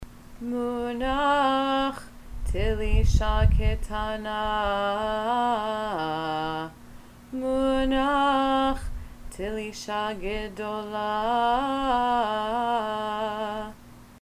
munach telisha k tanah munach telisha gedolah

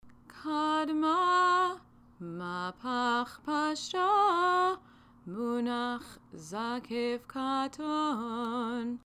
kadma mahpach pashta munach zakef katon

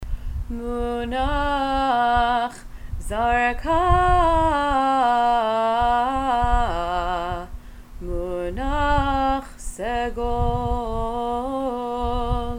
munach zarka munach segol

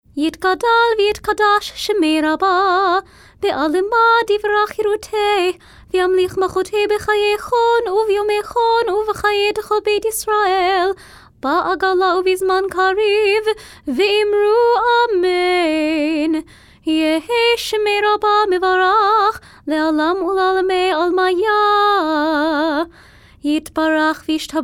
hatzi kaddish weekday minor

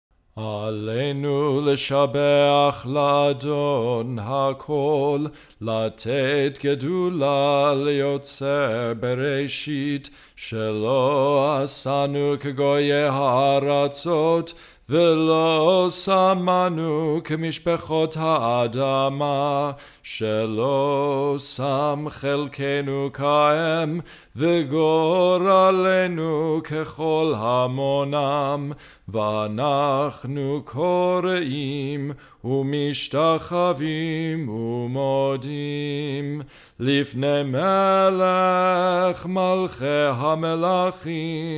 aleinu congregational melody; s. sulzer

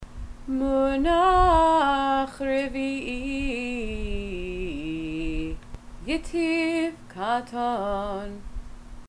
munach revi i yetiv katon; Cantillation for Megillat Esther; Purim; The Jewish Theological Seminary; Munah Revi’i Yetiv Zakef-Katon

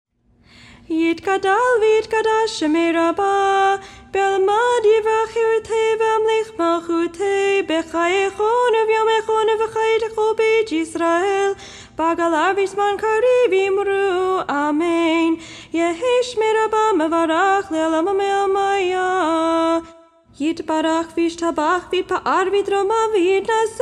hatzi kaddish nusach minor with festival ending

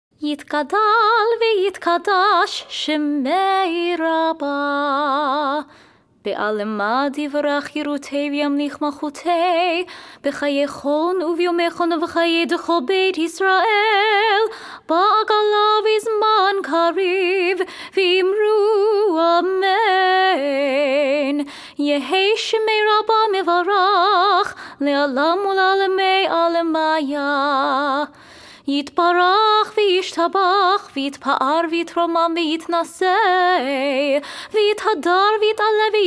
hatzi kaddish nusach minor

